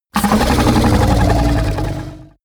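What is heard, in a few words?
fishman snort gargle aggressive loud, creatures; kreaturen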